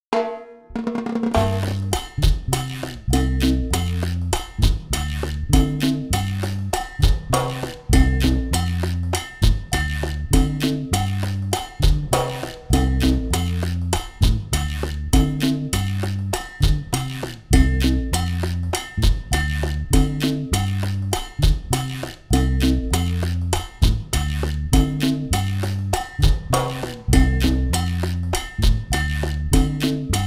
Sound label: Chachacha